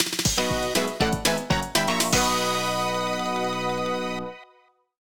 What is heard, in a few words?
Jingle Finish!; StreetPass Mii Plaza; Slot Car Rivals